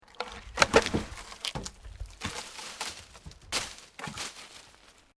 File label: Wood Logs Movement Major; Material components for constructing more complex sounds; Materials